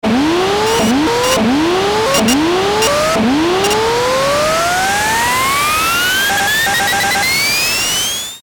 Stutter Build Up Distortion Glitches; Production Element Imaging Element Accent Transition

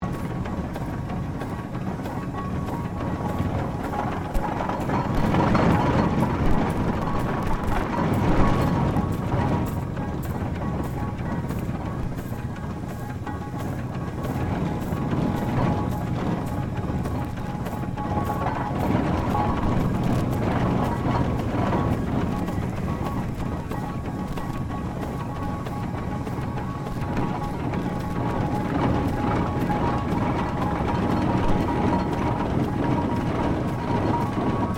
Tractor steam traction engine 1900 operating; John Fowler

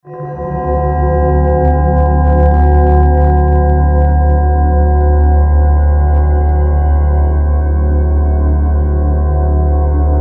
Synth, Stab, Mysterious; Bell

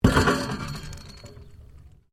MECHANICAL CLANK RUBBER METAL; Variou smechanical sounds; Clanks (Sound Effects:Objects)

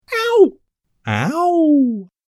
Male Pain Sounds

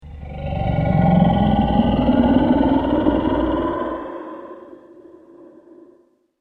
Dragon Of The Dark, monster sound effect; fantasy sound effect

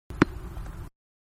Tennis Hardcourt-Ball Bounce-One Time; Sports; Manhattan Production Music

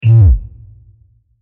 Short And Deep, drum; Drums; Musical; Crafted Signals

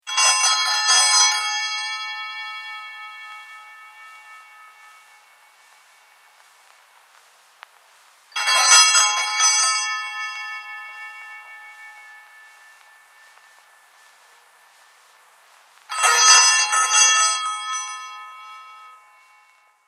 Bells Ice Cream Truck; Musical

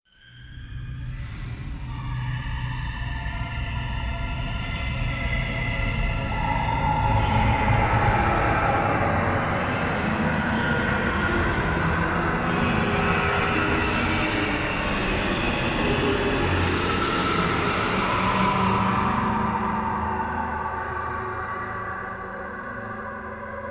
Drone Horror Vocal Torture Chamber Metal Scraping; Texture Ominous; Scary Soundscape

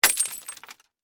Impact Beer Bottle, Smash; Smashes; Objects; BlastWave

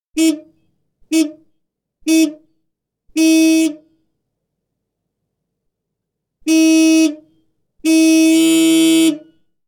Car, exterior, horn, six blasts; Cars; Vehicles; BBC

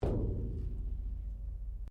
METAL IMPACT QUIET; Metal Sound Effects; Metal (Sound Effects:Materials)

